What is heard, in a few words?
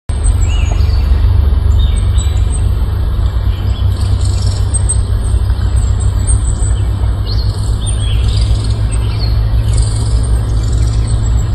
Aphrastura spinicauda; Lago Gutierrez